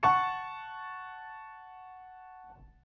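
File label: F#m higher, chords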